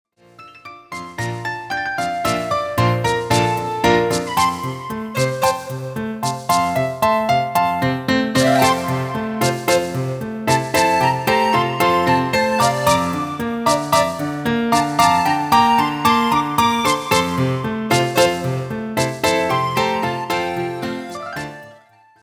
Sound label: Piques, Ballet